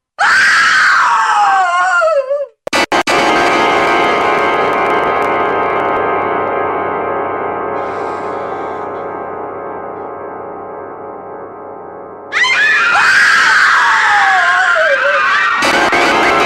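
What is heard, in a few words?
Background, Woman's scream and creepy sounds; Fon Krik zhencshiny i zhutkie zvuki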